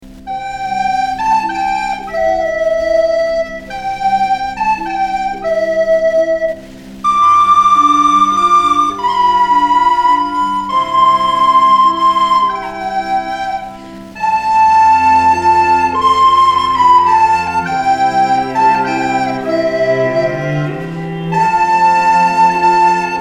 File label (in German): blockfloete stille nacht